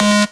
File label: Synths G#; Vengeance Samples